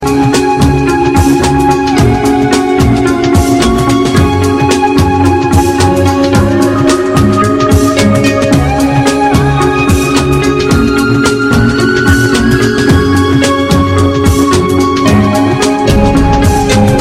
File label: Cool Musique